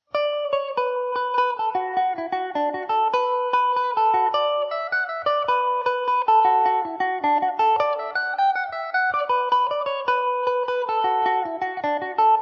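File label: B minor, Irish mandolin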